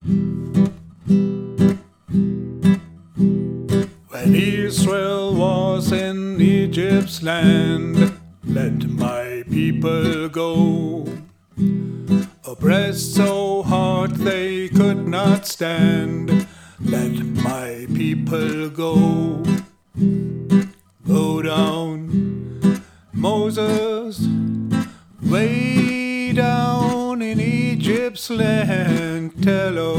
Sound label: Go down Moses